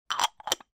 JAR CERAMIC

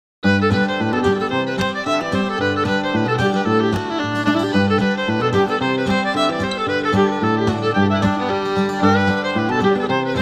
Guitar and Fiddle

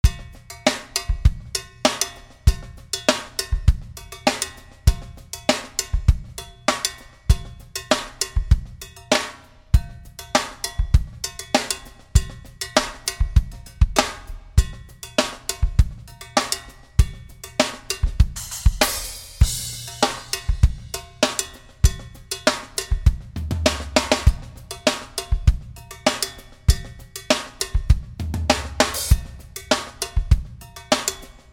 Cowbell Groove Acoustic Drums

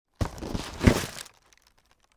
BOX SHOE

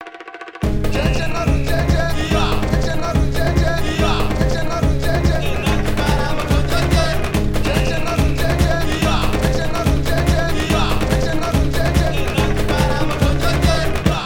Congo Rhythms With Vocals

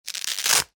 VELCRO

